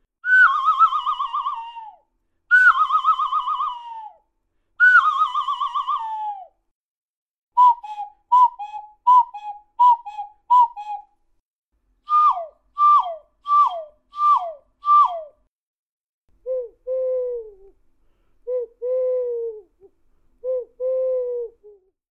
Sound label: Bird Sounds, Sihan, Flöte; Die Sihan reduziert die Funktion einer Flöte auf ihr Wesentliches – ihren Klangerzeuger –; Vokophon